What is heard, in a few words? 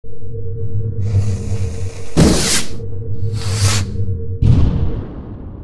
Rocket